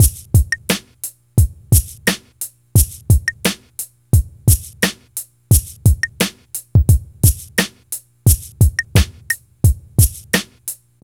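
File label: DRUM, 87, L, Hip Hop and R&B; Best Service ProSamples vol.02; AKAI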